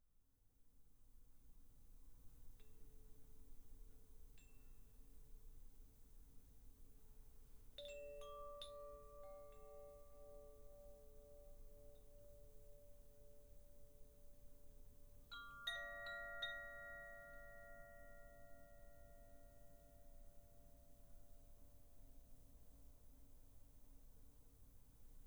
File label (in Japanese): コシチャイム 遠い